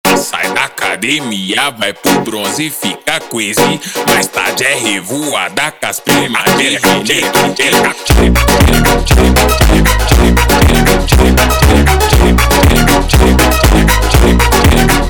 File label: Montagem slowed